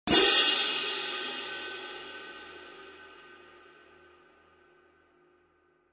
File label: 20 K Constantinople Medium Light Pair, cymbal